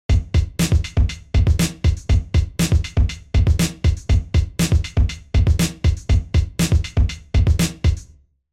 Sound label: Fat Drum Room